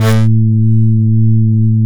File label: FM SYTH, TURBOTRONIX; NorthStar - The Wizard VOL-2